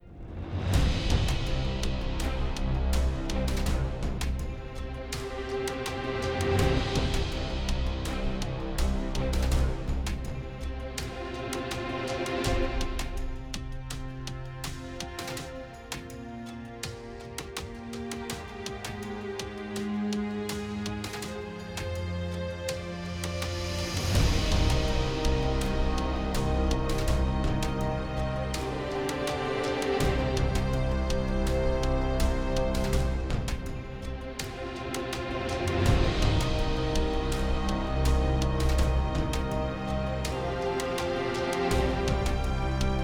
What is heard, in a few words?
loop